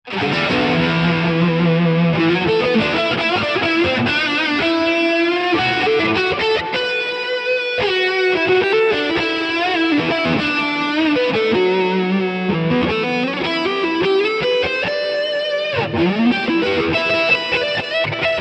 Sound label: Archetype Smooth Lead